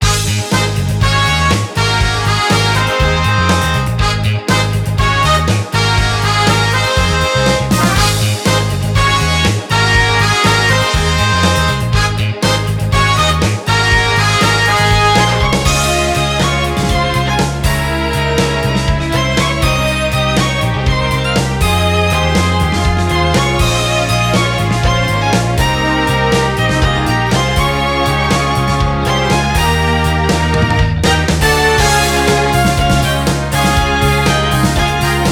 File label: Music event; Power Pro Kun Pocket R